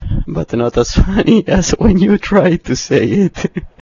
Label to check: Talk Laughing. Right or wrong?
Right